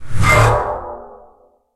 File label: respawn; ctf